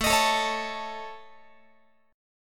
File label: A Major Flat 5th, Guitar; AMb5; strummed; x,17,18,19,17,20